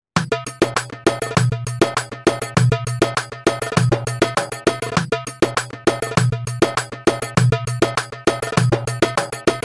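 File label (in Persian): pad, پد برای ارگ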